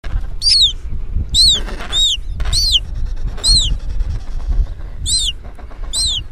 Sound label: Chick